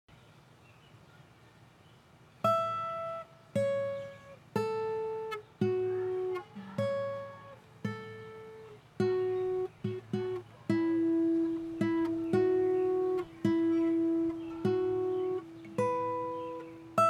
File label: Guitar Attempt